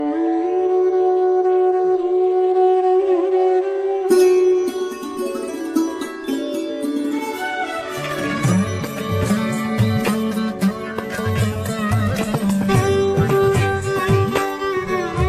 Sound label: Desh Bhakti Ringtone Instrumental